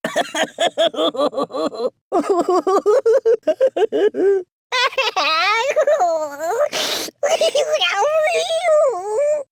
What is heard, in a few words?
cry; kick the buddy